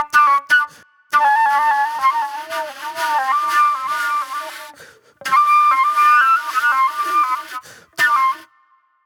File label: Fast Funk Flute